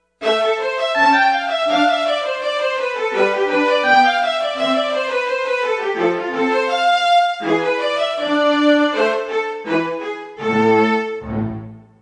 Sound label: Chamber Str, Yamaha TG55; Factory Presets